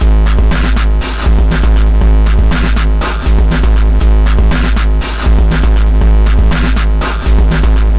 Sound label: electrice 120bpm